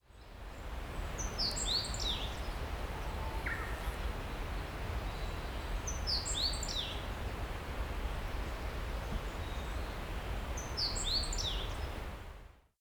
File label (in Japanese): hooziro, bird song